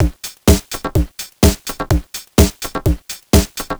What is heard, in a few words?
Drums, 126bpm, Nu Dance Electro; Zero-G - Soundclash; Frankfurt Zwei